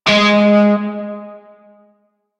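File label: Shawzin, Octave Two G Sharp; Music; Warframe; Narmer Shawzin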